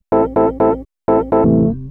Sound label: house synth 125 A, keys